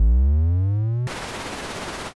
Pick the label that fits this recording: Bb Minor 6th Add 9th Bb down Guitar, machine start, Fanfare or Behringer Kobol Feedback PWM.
machine start